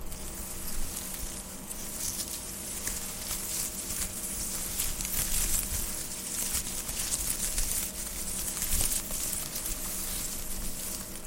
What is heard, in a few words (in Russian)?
zvuki vklyuchennoj vodi v dushe